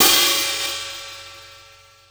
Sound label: Hihat Open Dry Funk Brush